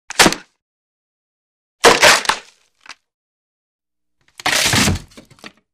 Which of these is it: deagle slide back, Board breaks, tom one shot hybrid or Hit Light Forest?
Board breaks